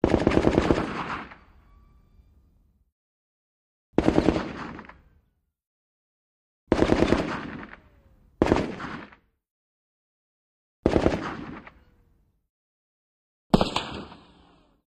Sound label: AK47 Short Bursts Ric